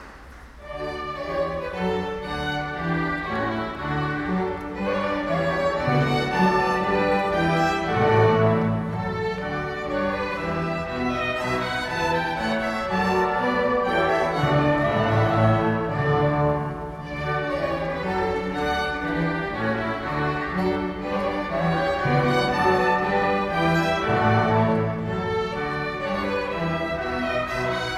Orchester, Tempo di Gavotta; Konzertmitschnitt vom 19. Dezember 2023

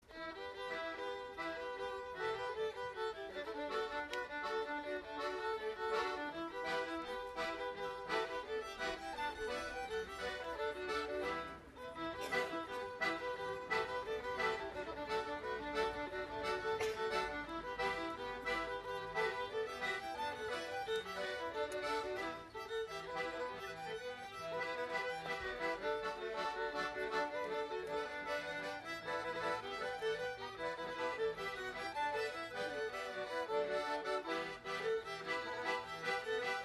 Folk Gruppe Reel; Cooleys Reel

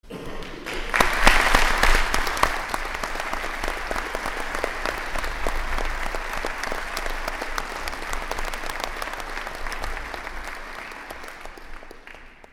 Unterstufenchor Rocking Carol Applaus

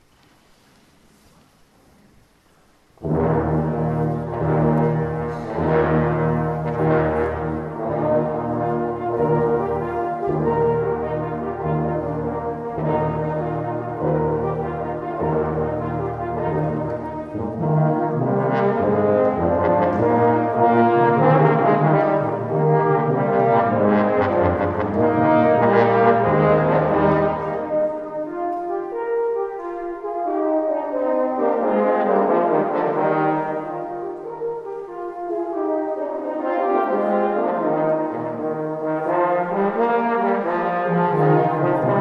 Blech

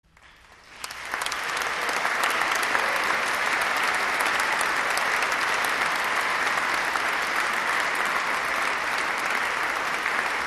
Applaus fuer die Kammermusik; Konzertmitschnitt vom 20. Dezember 2016

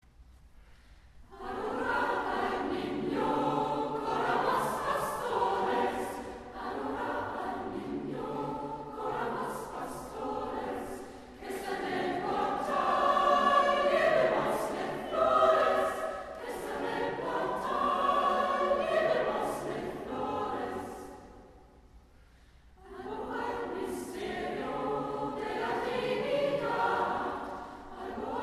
Chor und Jubilaeumschor

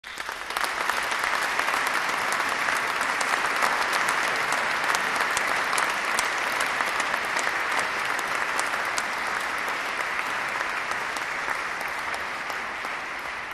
Applaus; fuer das Junge Ensemble; Konzertmitschnitt vom 20. Dezember 2016

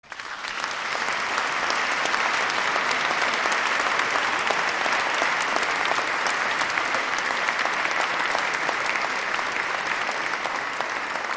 Applaus; Chor Und Jubilaeumschor Herr Wetterich Orgel Ding Dong Merrily On High Traditional

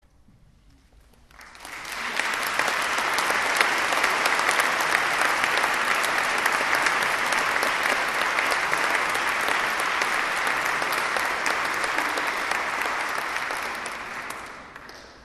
APPLAUS; Chor und Jubilaeumschor; Hevenu Shalom Alejchem; Traditional Israel

